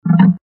guitar cut

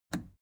plastic button scratch smooth release enable

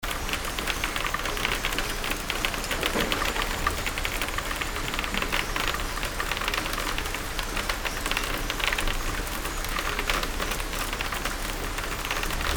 conveyor belt

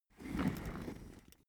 office chair trundle slow short

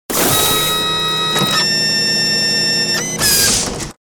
servo action style

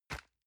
envelope grab medium

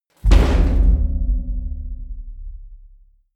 metal crash ring small

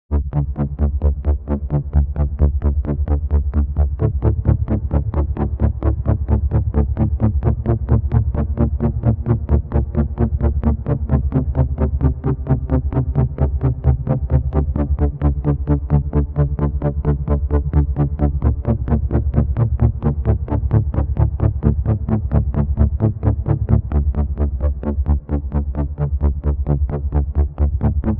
pulsating deep synthesizer sequence

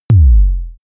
sub kick short

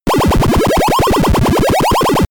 lasergun

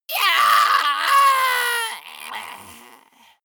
monster die high

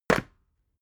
water boiler put down short

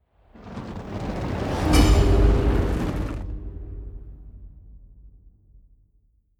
whoosh magic fire bowl

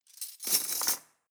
cutlery teaspoons drawer place soft

